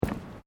Footsteps Boots Boardwalk Wooden Step; Footstep Foley; Human

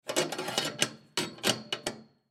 Folding Table Lock Leg In Position; Miscellaneous Household; Blastwave

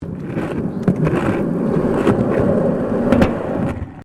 SKATEBOARD UNDERSIDE ASPHALT SMOOTH; Skateboard sounds; Skateboarding; Sports

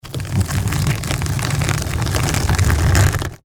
Stone Door Close, Horror, Stone, Door, Close; Scary Soundscapes (Sound Effects:Horror); BlastWave

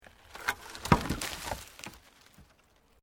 Wood Logs Movement Major; Material components for constructing more complex sounds; Materials

